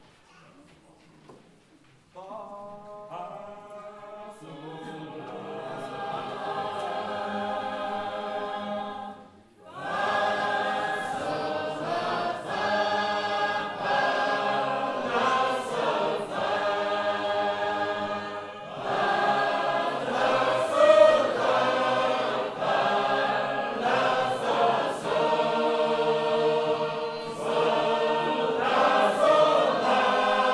Sacred Harp; Rockbridge

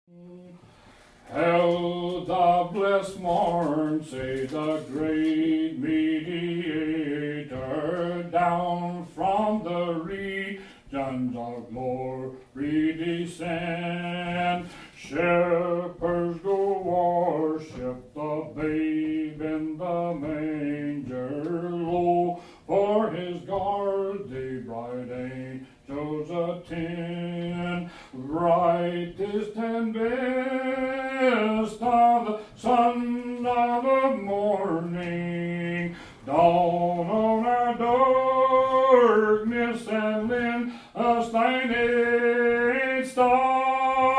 solo, sacred harp; Star in the East

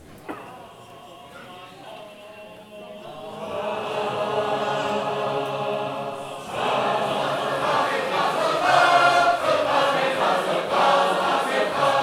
Sacred Harp; Creek

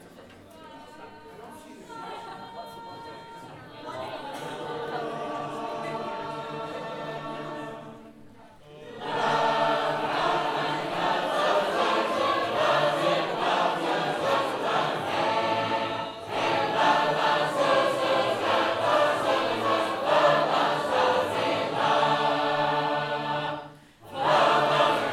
singers, sacred harp; Vermont

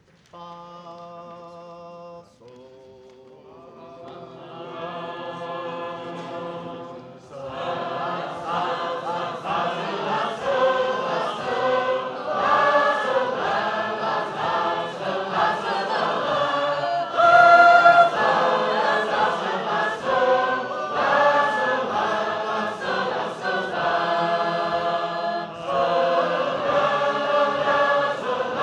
Sacred Harp; Garden Hymn

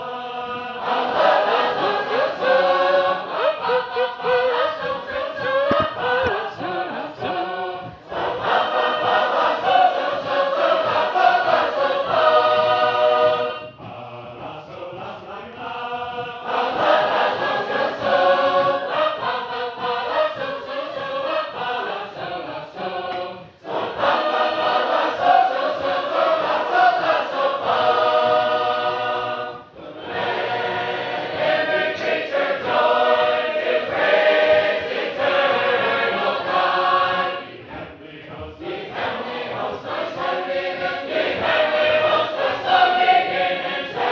Newburgh, sacred harp

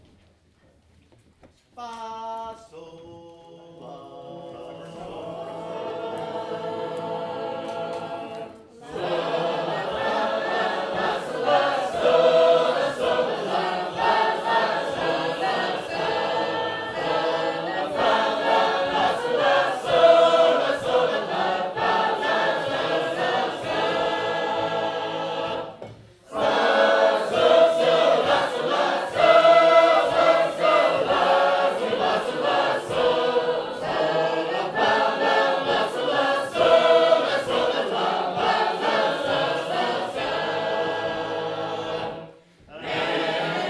Heavenly Armor, sacred harp

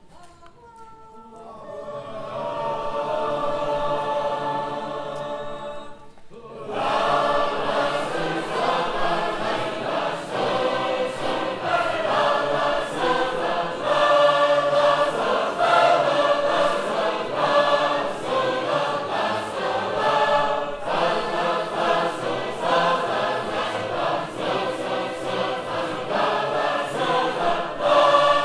Sacred Harp; Hebrew Children

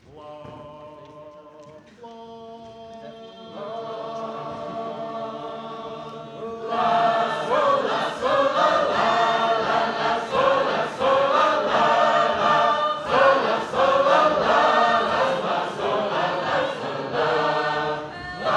Ecstacy, sacred harp

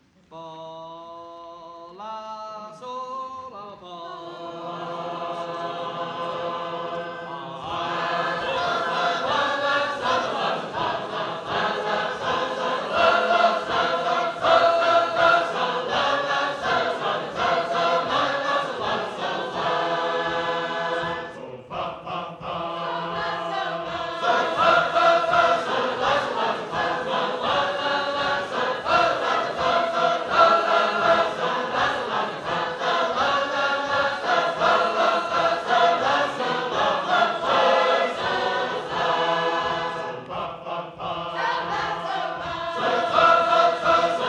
Wootten, sacred harp

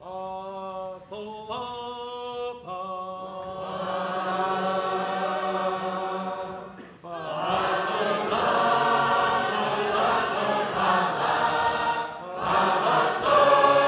The Dying Californian, sacred harp

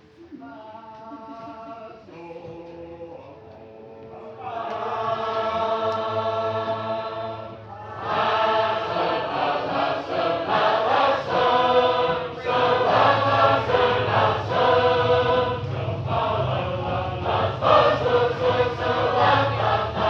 Northfield, sacred harp

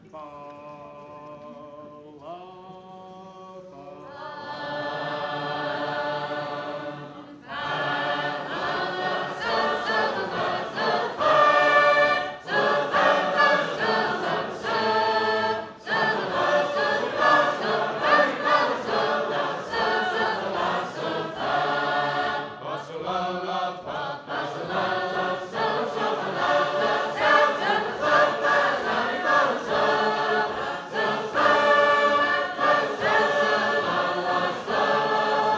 A Glad New Song, sacred harp

